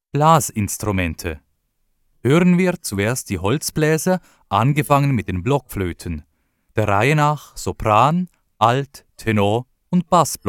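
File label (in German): Blockflöten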